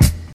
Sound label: acoustic kick drum sound e key; tuned drums e key